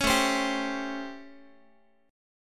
A 7th Sharp 9th, Guitar; A7#9; strummed

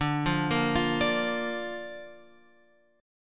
D Minor Sharp 5th D; Dm#5 Chord {10 8 8 10 X 10} arpeggiated

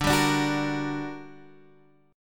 G Minor Major 7th, D, guitar chord

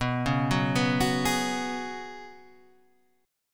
F sharp Suspended 2nd Suspended 4th B

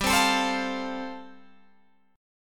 G 9th Suspended 4th G down Guitar Open G

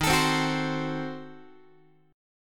D sharp Diminished 7th D sharp Guitar; strummed; D#dim7; x,11,12,10,10,11; JGuitar

